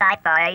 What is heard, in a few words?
gameover; q-bert rebooted gamerip 2014